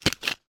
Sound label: mince pie or apple pie style cake remove from tin tray